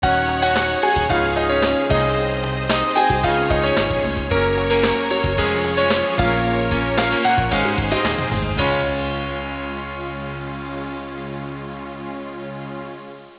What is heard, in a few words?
bg sound